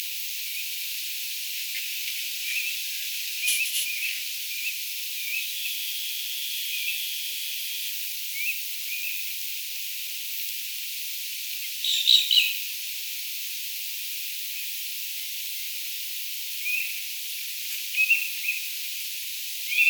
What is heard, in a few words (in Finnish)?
paikalle saapuu tyllejä, ne ääntelevät tullessaan; Tuollaisia tyllejä, korkeaotsaisia, saapui kolme rannalle tai ilmestyi jostain